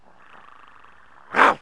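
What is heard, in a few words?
short growl, animals; Wired for Sound Pro CD; Aristosoft, Inc.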